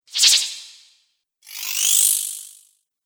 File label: Magic Spells; Magic Sound Effects